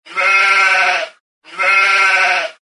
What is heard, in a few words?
sheep baas